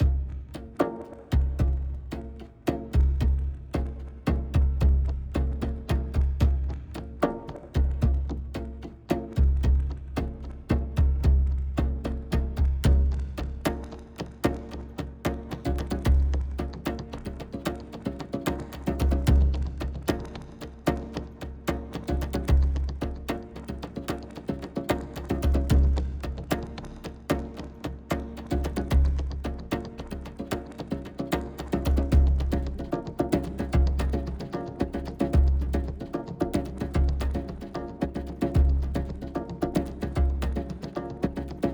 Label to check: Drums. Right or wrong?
Right